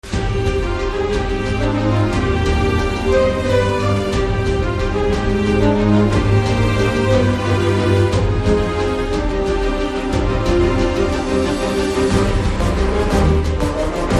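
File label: background music Telugu Ringtone; Nota; The Rise of Leader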